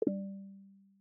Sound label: navigation backward selection; Primary System Sounds; Material Design